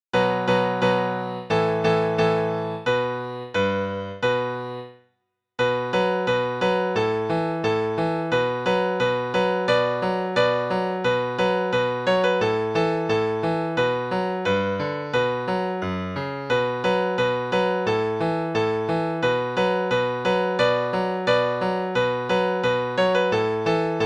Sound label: piano part